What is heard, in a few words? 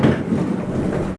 Lift Op; GTA - Long Night